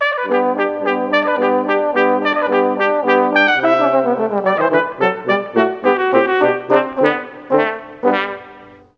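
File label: trombone trumpet horn; Juilliard Music Adventure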